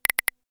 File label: direct, notification sounds